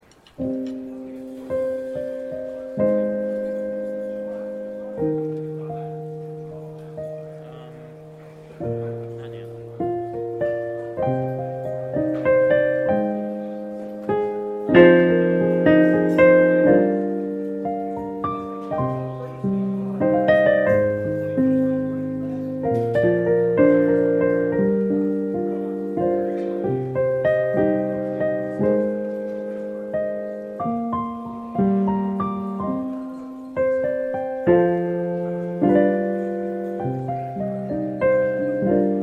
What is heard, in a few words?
Keyboard Intro; Bais Tefilah Dinner 2012; Shmorg